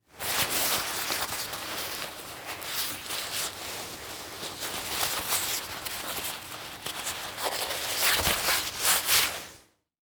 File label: Foley Coat Leather Coat Movements